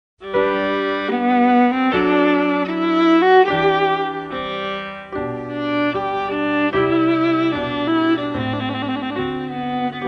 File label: viola and piano; Viola Sonata in G Major arr. D. Preucil; I. Largo